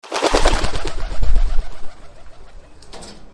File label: pigeon